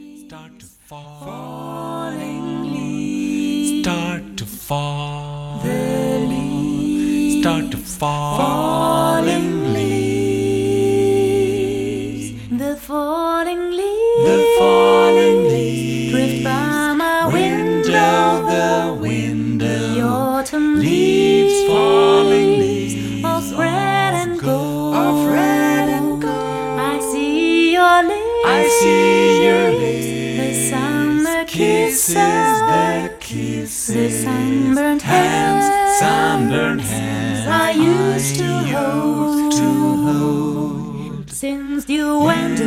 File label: Jazz Ballad short